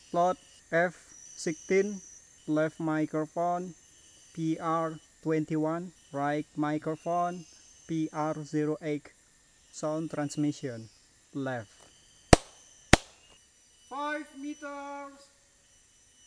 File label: birds